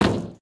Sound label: metal, human step